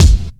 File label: clear bass drum one shot d sharp key; kick drum